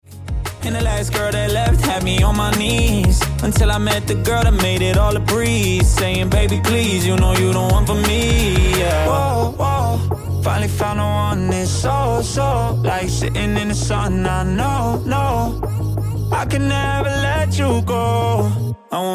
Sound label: Ringtone